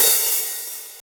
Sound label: open hihat real ish tik